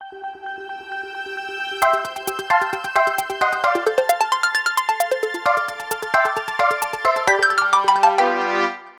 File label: Jingle 2; super mario maker 2